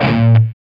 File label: SOLDANO ROCK, Guitars